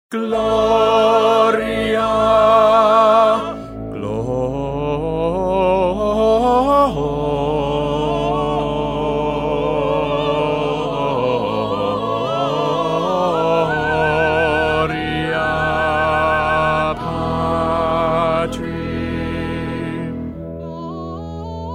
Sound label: Magnificat bass; bach; magnificat 4